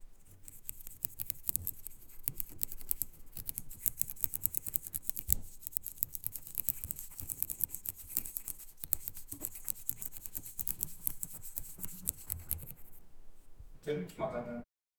traditional hand weaving; craft

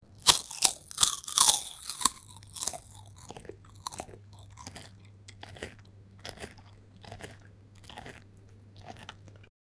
eating loud fast food cru, crunch

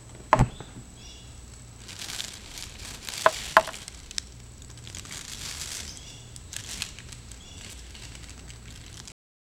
birds chirping rustling

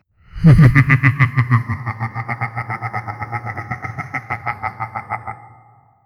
a subtle malevolent demon, supernatural

